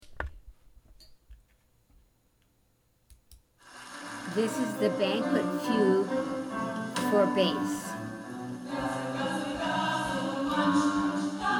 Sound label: fugue; banquet